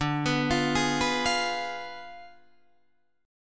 D Diminished 7th D down Guitar Open D

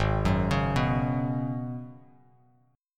Ab Minor 11th, Bass; Abm11 arpeggiated; JGuitar

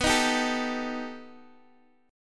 B Augmented 7th B down Guitar Open G

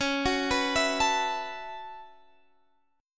B 7th Suspended 2nd Suspended 4th C sharp

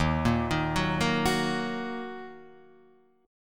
D sharp Minor, chord

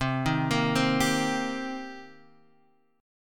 C Minor 7th, Guitar, Half Step Down

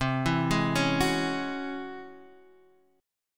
Db Minor Major 11th C

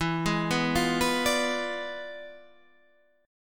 E 7th Flat 9th Guitar Standard, chord; E7b9; arpeggiated

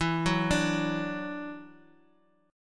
E 7th Suspended 2nd, down; E7sus2; arpeggiated

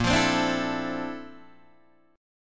Bb Major Sharp 11th Bb down Guitar DADGAD, chord

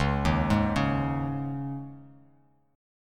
C sharp Suspended 2nd C sharp, chord; C#sus2; arpeggiated; JGuitar

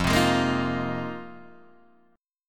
F Minor 11th, Guitar Open D

